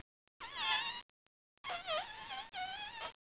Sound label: sqweek